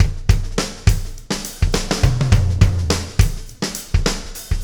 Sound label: Beat, Loops